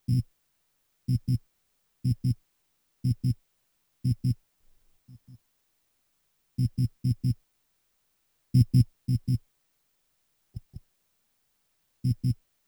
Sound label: 030bpm; elektron machinedrum